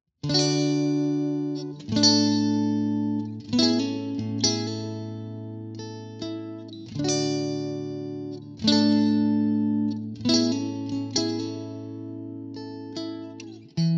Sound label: clean; jensen tone